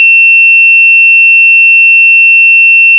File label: PI50 Alarm Sound; Simrad; EK15